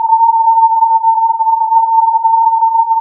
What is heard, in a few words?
filtered input